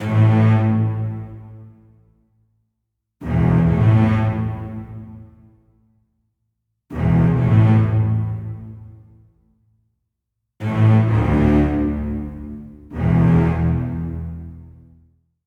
strings low 130 Am

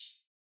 Hi-Hat

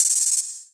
Open Hat

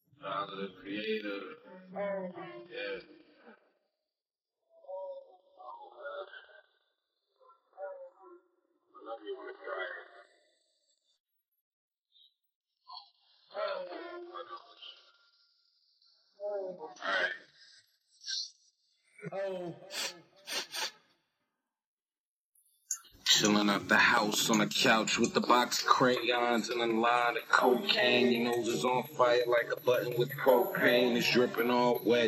Acapella

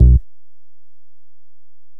Percs

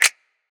Snap; Ardist

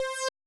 Synth

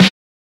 Snares

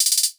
HAT ROLL, Closed Hats

